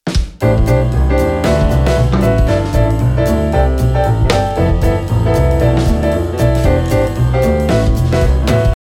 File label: V quartal backing